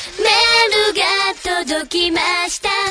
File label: alarm